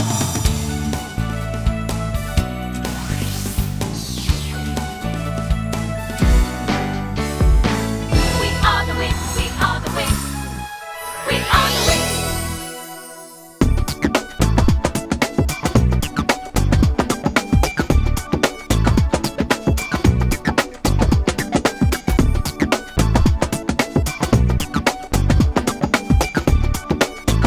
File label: Menu Music